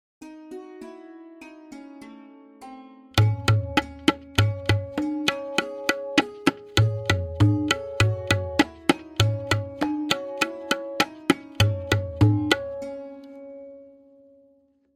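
Main Bol 2x-Played with Lehra, Benares Kaida 1 at double speed, Tintal; Benares Kayda 1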